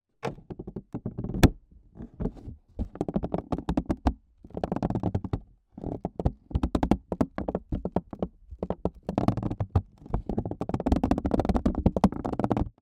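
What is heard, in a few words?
foley plastic container small pressure creaks recorded in container; zapsplat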